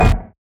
burst; Watcher

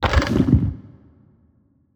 organs; Thalamus; CARRIER

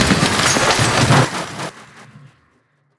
Explosion Debris; Weapons

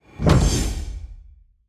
Door

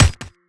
chain Gun Shot; Weapons

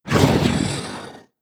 MUDRAPTOR idle

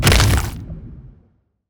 Armor Break; Damage

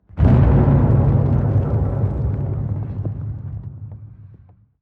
Structure Blunt; Damage

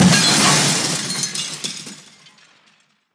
Glass Break; Damage

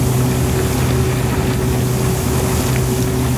harpoon Move Loop; Thalamus; CARRIER

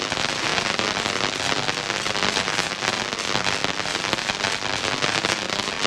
Welding Loop; Tools

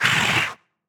death; Barotrauma; Crawler

